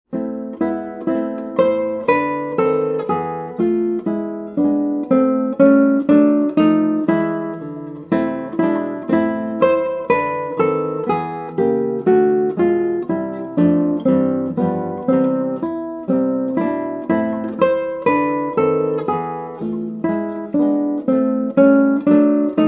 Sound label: Etude, guitar